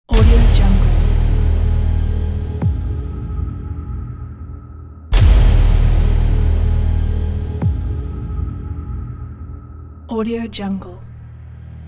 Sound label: hybrid trailer